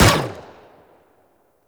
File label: Energy Rifle Fire; Warframe; Venus; Venus Spaceman Carrier Agent